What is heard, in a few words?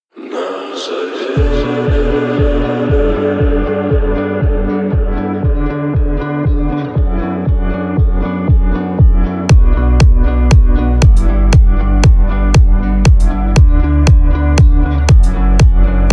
deep remix